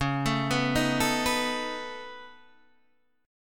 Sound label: C sharp 7th Suspended 2nd Sharp 5th C sharp down Guitar Open D